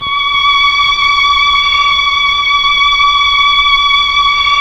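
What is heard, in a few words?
Mellotron Strings D6, Slow Strings; Keyboards of The 60's and 70's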